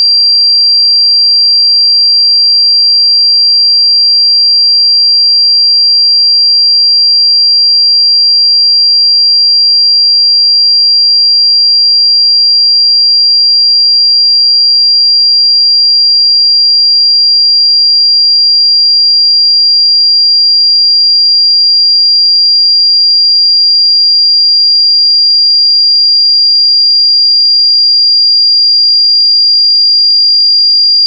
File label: 4733 SINE LOG; SINE WAVEFORMS